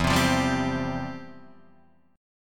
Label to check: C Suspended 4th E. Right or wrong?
Right